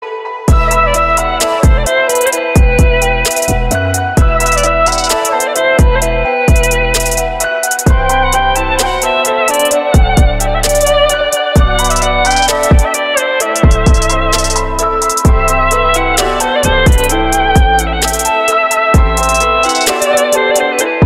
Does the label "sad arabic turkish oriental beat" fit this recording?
Yes